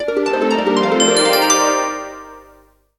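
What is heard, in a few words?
Rise short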